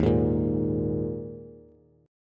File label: Eb Suspended 4th Eb Bass; Ebsus4 strummed; JGuitar